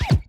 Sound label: clipboard receive; NVDA